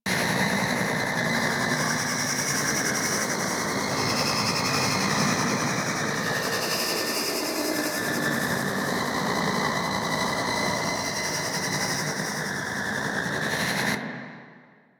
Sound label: Mine Loop; Warframe